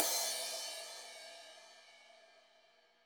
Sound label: CYM; Bob Clearmountain Drums I